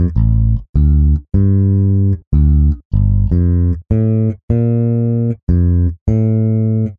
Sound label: BASS; multitracks